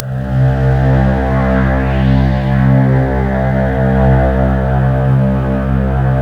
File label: STRING; Best Service Advanced Media Trax Building Blocks; SIERRA